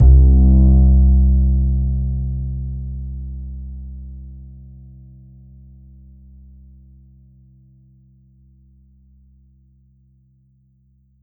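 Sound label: Alesis Sanctuary QCard Acoustic Bass C2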